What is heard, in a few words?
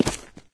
concrete, player footsteps